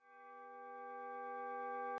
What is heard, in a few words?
Instrument